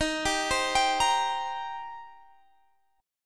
Eb 6th Eb down Guitar Half Step Down; arpeggiated

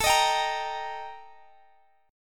Eb Major A; strummed; JGuitar

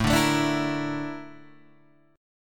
A sharp Major Sharp 11th, A

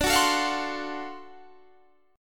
D 7th Sharp 9th D down Guitar Standard; strummed; JGuitar

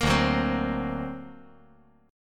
D Augmented 7th D down

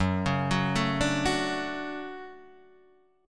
D-Major 7th Gb

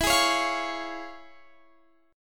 C sharp Major E

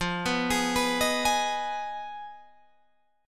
F Minor 7th Flat 5th F down Guitar Open G

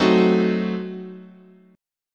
F sharp Diminished 7th, chord, guitar

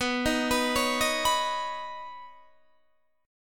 B Major Add 9th B Guitar DADGAD; arpeggiated; 21,18,21,18,18,21

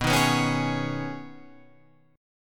B 6th Add 9th B Guitar Standard, chord; strummed

